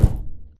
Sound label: Footstep Jog Far; Titanfall 2